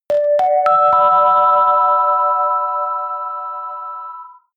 bell melody happy

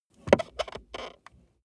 plastic plate crevice rub squeak

